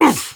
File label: soldier pain sharp, ru